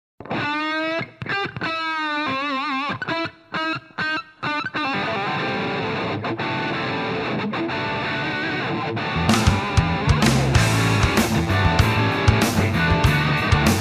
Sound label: funk